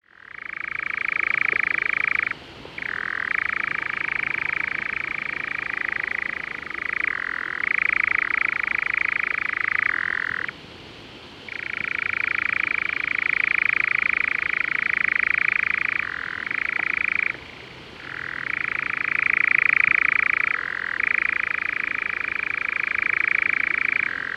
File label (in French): Engoulevent Europe Caprimulgus europaeus; Wiki media Commons